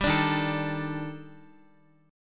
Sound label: Eb Major Sharp 11th Eb Guitar; EbM#11 strummed; JGuitar